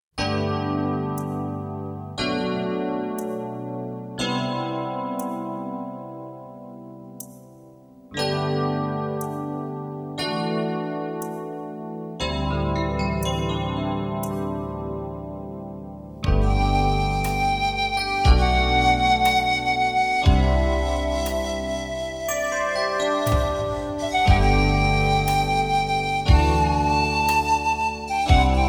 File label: Flute